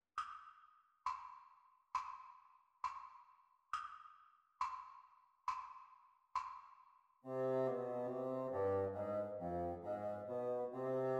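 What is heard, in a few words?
bassoon duets practice speed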